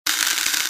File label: slow pour of sand in plastic bucket; short